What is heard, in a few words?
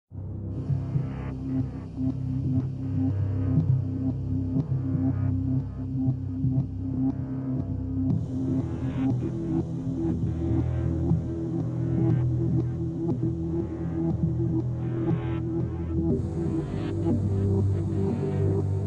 game bg short; yumpod